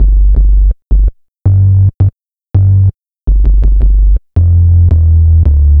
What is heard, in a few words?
165bpm Dm, Drum & Bass, Bass Loops; Total Drum&Bass